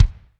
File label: DM5 Kick, Alesis DM5 drum machine